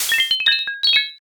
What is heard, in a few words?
glass break